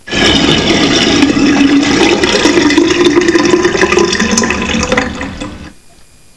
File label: Water Gurgling